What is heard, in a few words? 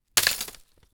wood breaking splintering